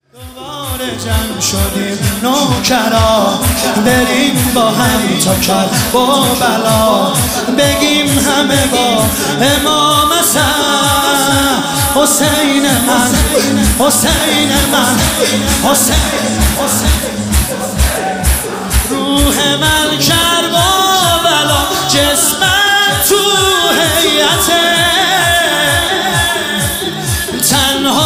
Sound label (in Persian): مداحی